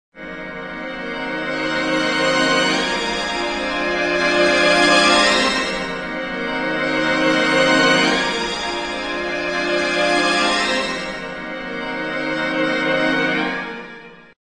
090 dramatic strings; STRINGS ORCHESTRAL CLASSICAL LOOPS

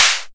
electro clap; Electro Kit; STYLE KITS (Hip Hop, Etc); Boy In A Band Electro Kit

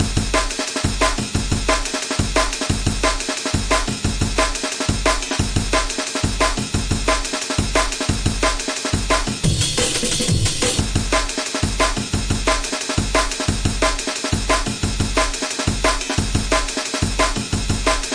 178 drums; On A Roll

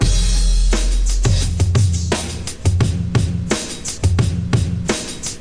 beat, 088 bpm, drum loop

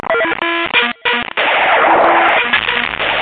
distorted superstereo synth

